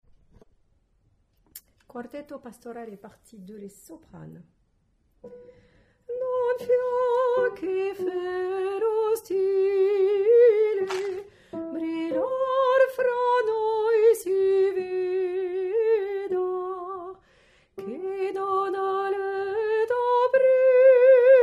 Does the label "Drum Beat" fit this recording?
No